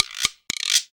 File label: Guiro 124bpm